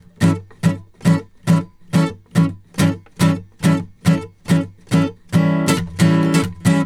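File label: Country Guitar, Pre Chorus; Digidesign; Pro Tools Essential Loops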